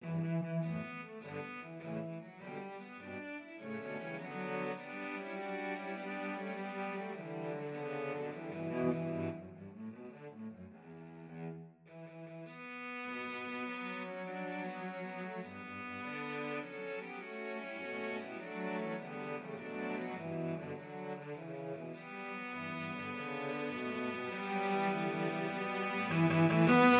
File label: cello quartet